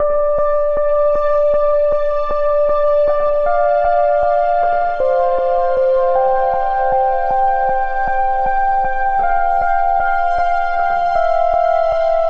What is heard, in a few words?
Epic Pads - Dmin 78 bpm; MIDI Clips; Tonal; Atmospheric; Ableton; Factory Packs; Mood Reel